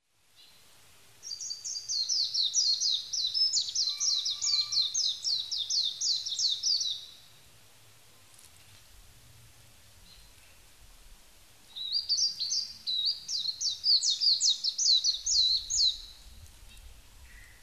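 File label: Japanese white-eye; mejiro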